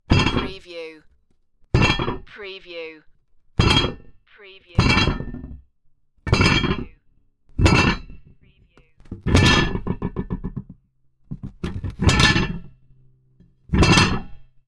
Heavy Chain Impact Multiple

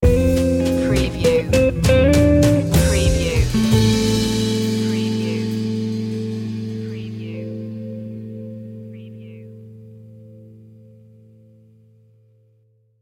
dusty jazzclub sting

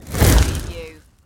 Fireball Pass By Heavy

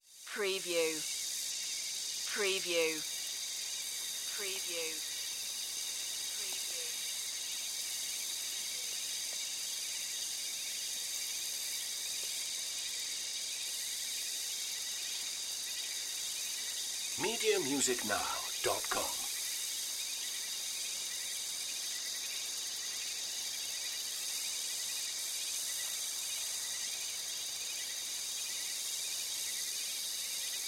Ambience Jungle Night

